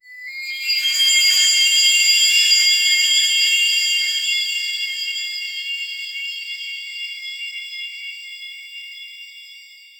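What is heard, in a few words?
a mystical swirling shield; air rush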